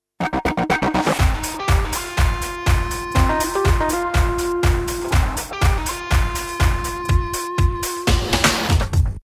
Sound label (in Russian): Korotkaia muzykalnaia otbivka